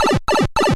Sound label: Warp Pipe; Wii